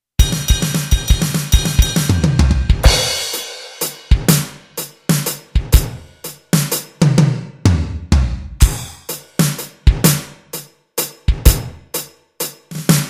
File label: groove